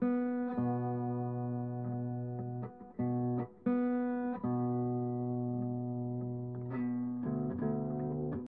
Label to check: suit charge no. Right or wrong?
Wrong